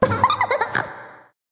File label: giggle; mlp